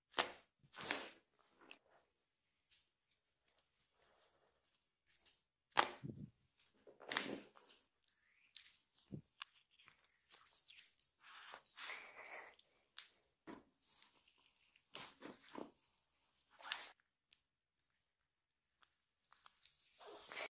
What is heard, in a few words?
Massage